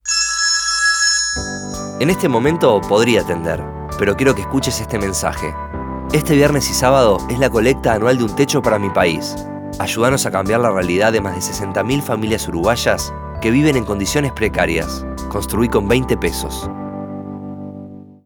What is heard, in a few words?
ringtone